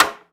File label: Aircrafts MiG-15bis Ratchet; DCS